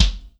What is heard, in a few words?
Kick